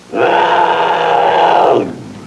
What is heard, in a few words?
leopard sounds